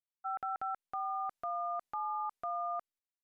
udial longer

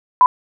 Test signal (44 kHz 24-bit) Noise level

